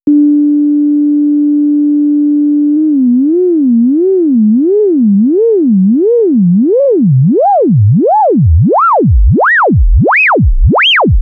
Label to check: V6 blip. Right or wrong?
Wrong